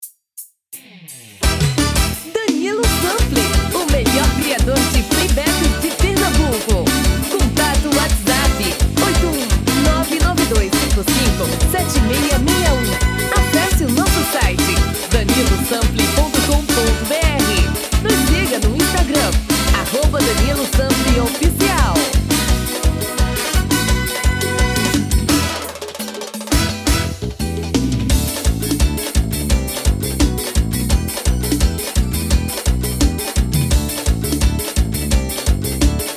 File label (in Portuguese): Caboclinho